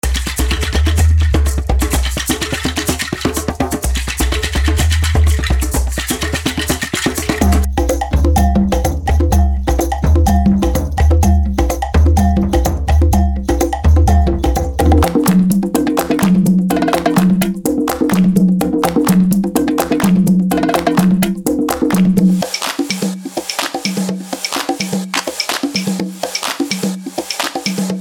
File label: tribal drumhits